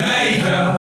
Key chant; Luxembourg Zwee

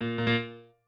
piano

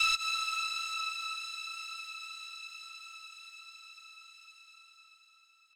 lead

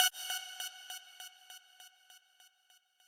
lead

